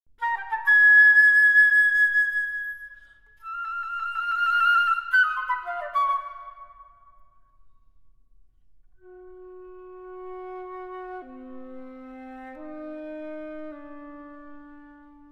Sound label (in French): Musique pour vents Quatre pieces Recitatif